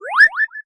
Bowser Flip; New Super Mario Bros. Wii

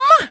jump; super mario sunshine

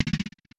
Rolling; Super Smash Bros

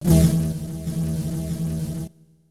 Beam Sword; Super Smash Bros

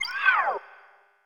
Click Whoosh; Super Smash Bros

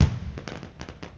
Firework; New Super Mario Bros. Wii

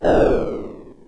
Giant Piranha Plant Fire Ball

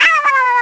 out of juice; Yoshi; Super Mario Sunshine

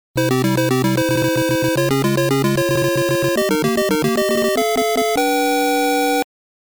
castle complete; Super Mario Bros. 1

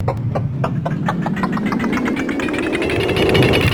Bone Rollercoaster Fall

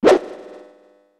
Strong Sword Whish; Link; Super Smash Bros

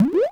Lakitu Cloud

